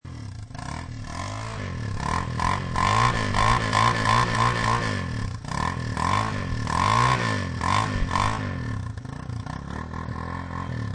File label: Dirt bike revs.; Motorcycles; Vehicles